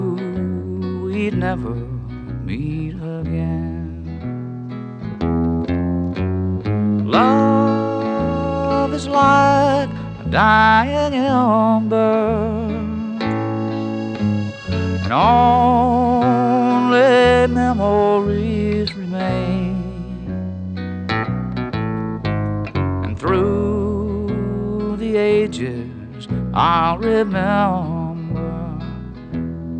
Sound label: country